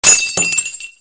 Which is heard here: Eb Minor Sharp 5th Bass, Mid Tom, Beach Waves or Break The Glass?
Break The Glass